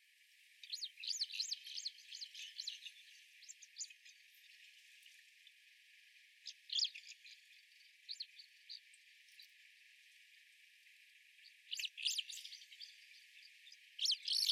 kanmuriumisuzu, tokushima, koe

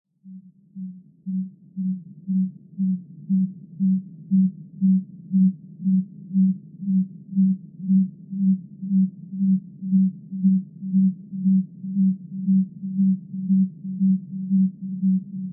okonoha

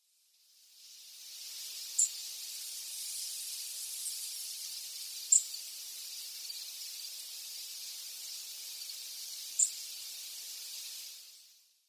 shiberiaaoji

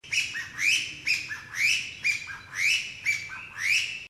kojukei

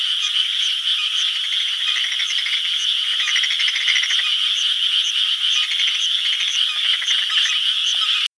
TSUTIGAERU; IBARAKI

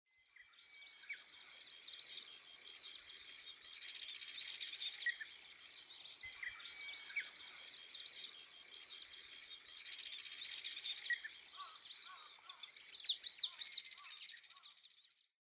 akakokko, koe; kuroda

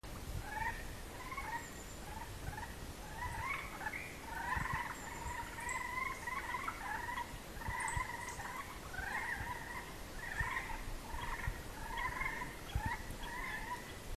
yamaakagaeru, hyogo

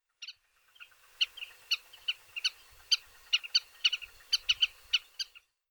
tsubamechidori chijou; miyako

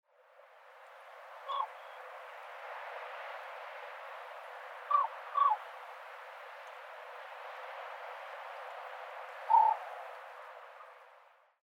watari, nemuro

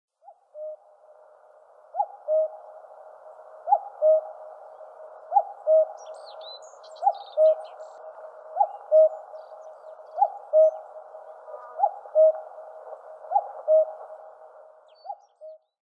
nakigoe kakko

